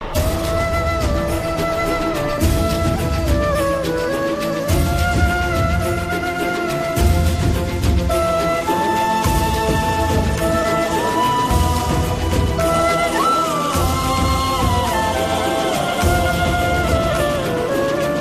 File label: Radha Krishna Flute ringtone; ringtones